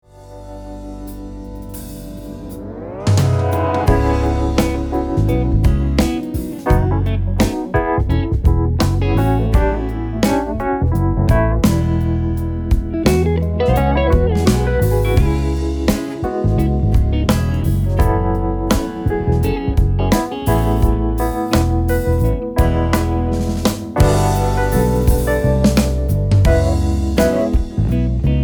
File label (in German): Schnippsel Groove